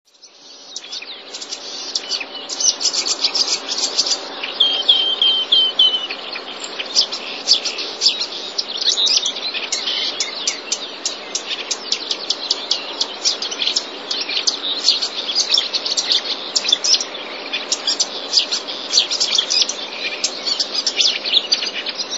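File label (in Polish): lozowka, czarnogłówki. Jest to mało udane naśladownictwo: dźwięki są dość wysokie, czystobrzmiące, ale lekko opadające na końcu; wroblowe; zapozyczenia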